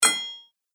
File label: Metal Blacksmith